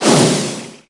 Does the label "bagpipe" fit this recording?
No